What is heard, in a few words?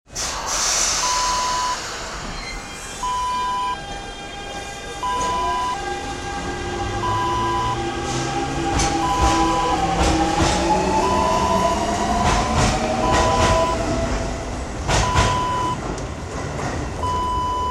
metro depart